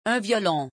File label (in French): violon